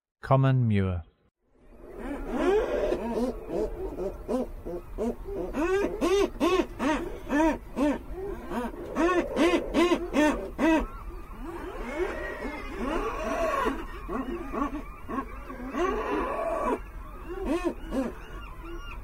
Common Murre; North America; Western USA; Western USA Songbirds

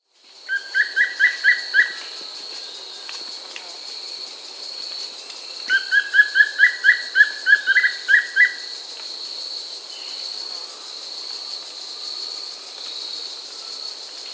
Wing-barred Manakin (Piprites chlori, S. America

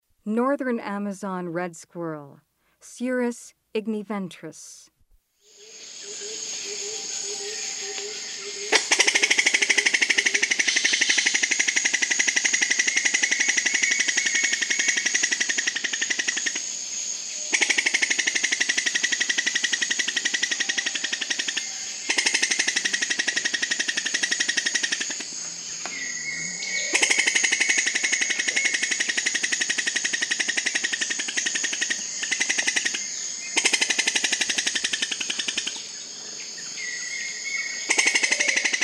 Northern Amazon Red Squirrel; S. America

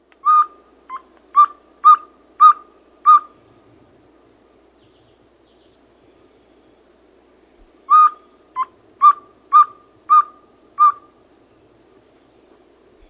Pale-Billed Antpitta; S. America

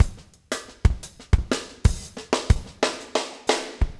motown 120, loops, 120bpm

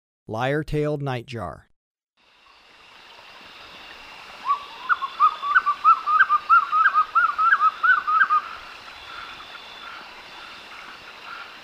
Lyre-tailed nightjar; S. America

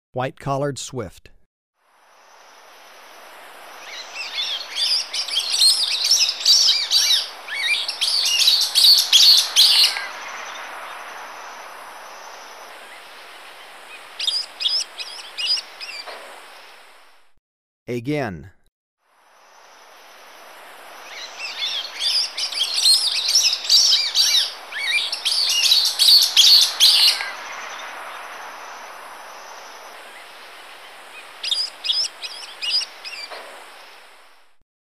White-collared Swift; S. America

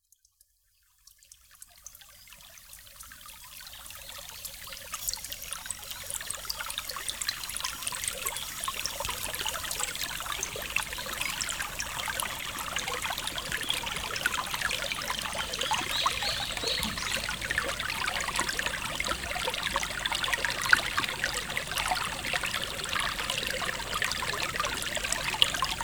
River Sounds Winds Accross a Stream; Nature Chill Out 2014

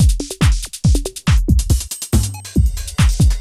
techno 140

